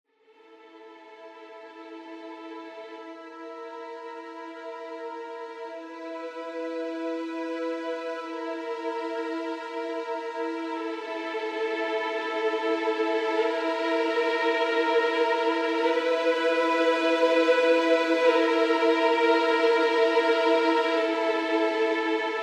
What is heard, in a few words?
String Ensemble; Native Instruments Symphony Series